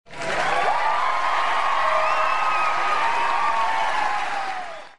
crowd cheering

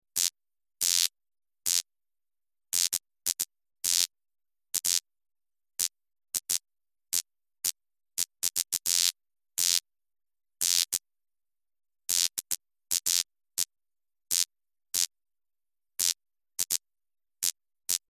flickering neon

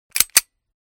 handgun cocking

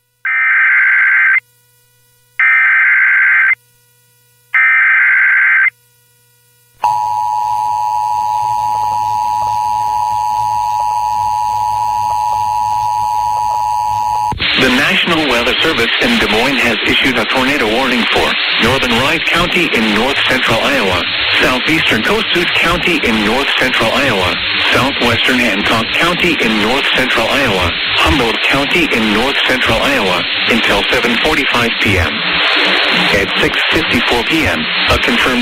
tornado warning

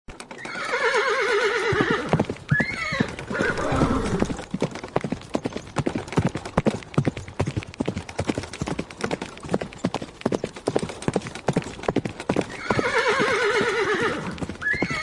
horse noises

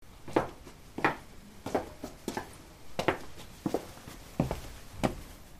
footsteps approaching

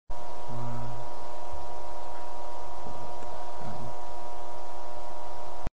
backrooms ambience

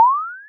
message pop

